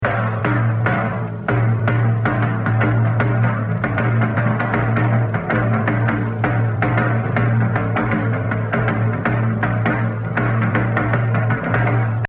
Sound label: Solo, jazz drumming; The Evolution of Jazz Drumming (Gottlieb)